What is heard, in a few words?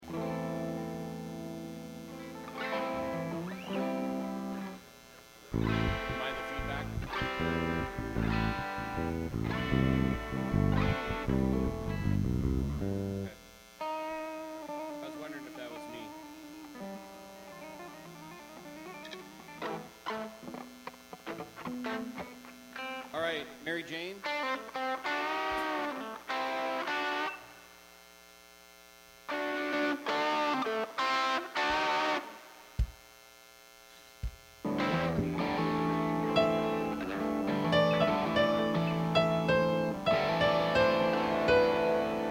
Buzz and Feedback Mix